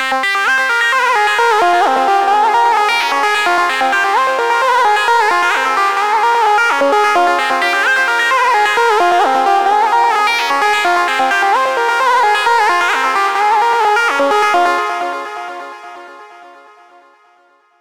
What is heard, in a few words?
melodic enchantment